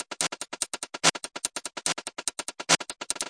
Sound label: 145 tech swing, drum loop; 141 to 160 bpm